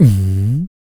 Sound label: KICK, MALE PERC; Spectrasonics Vocal Planet; Jazz FX